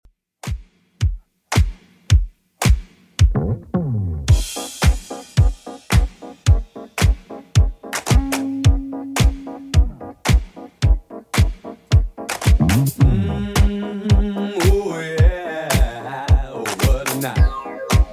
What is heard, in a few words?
70s Redrum 110bpm